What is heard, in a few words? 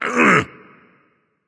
ouch, voice; Smite; ymir skin14